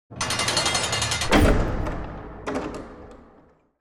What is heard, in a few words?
Prison cell door gear clatter closing